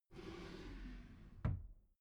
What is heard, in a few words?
desk drawer close soft